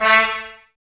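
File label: Brass trumpets A, Mute; Miroslav Vitous; Woodwind Brass Ensemble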